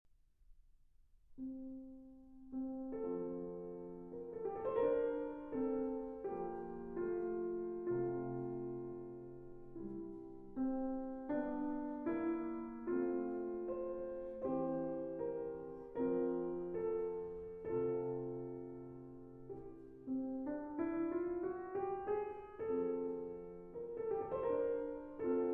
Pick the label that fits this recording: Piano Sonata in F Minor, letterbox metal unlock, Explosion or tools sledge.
Piano Sonata in F Minor